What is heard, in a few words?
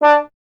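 bass trombone D; Miroslav Vitous; Solo Instruments VOL-1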